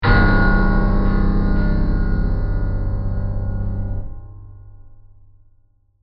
Synth Stab Death, Production, Element, Synth, Stab, Death, Bell; Stabs; BlastWave